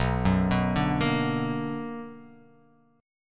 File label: B Minor Major 7th